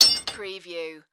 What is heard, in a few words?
Metal Clanks